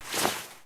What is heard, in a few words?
sleeve